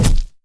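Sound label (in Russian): короткий точный слабый удар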